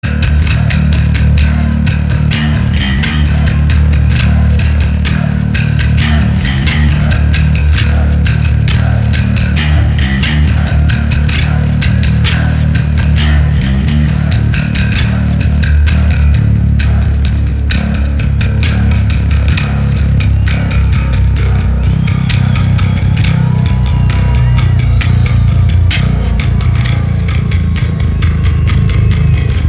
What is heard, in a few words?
Bass Solo